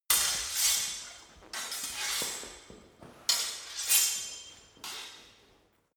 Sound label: sword hit sword sequence